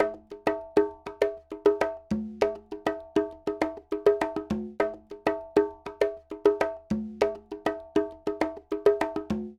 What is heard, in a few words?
Conga, Latin Percussion